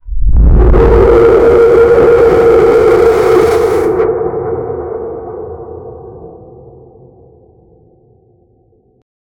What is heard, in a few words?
eerie monster sound